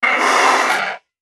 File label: Queen Hit Critical; we are the dwarves gamerip 2016